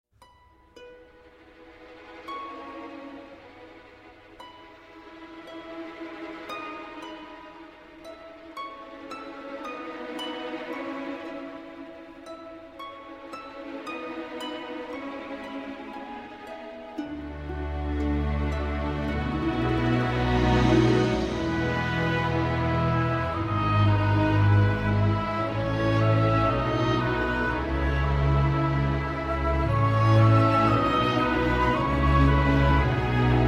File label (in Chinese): 抒情的背景音乐; 3分以内